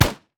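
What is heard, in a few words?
turret basic attack; LoL; heimerdinger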